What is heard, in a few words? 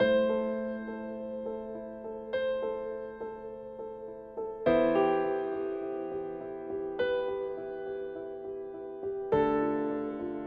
A Slow Autumn, piano orchestral